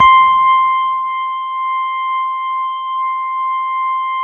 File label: C5, Roland JV 2080 CLUB ORGAN